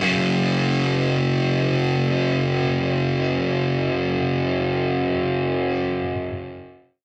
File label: chuk, Guitar; Notes